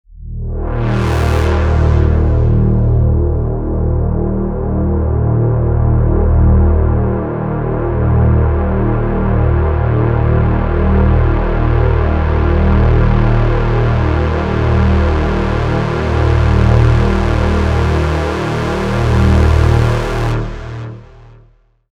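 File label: DSS Swell Delay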